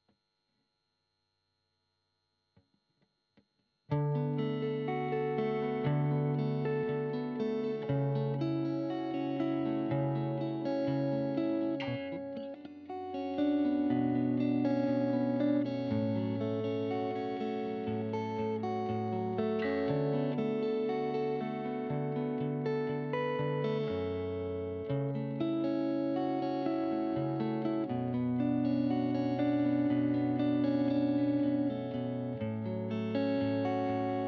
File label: GTR Royer